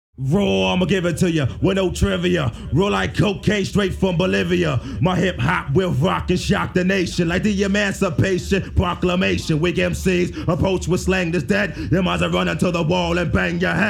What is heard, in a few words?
acappella